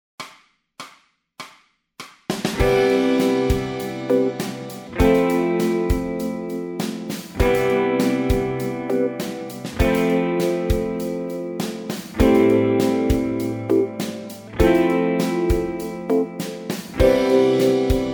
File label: bass modes, backing tracks